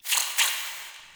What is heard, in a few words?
Interior Hiss; Ambience; Indoor; Warframe; Infested Eris